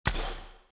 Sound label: close